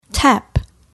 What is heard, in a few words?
tap